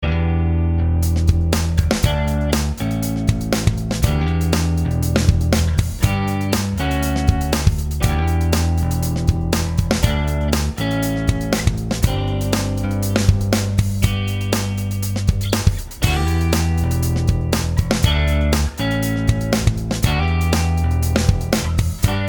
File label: Funk jam